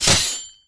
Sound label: equip weapon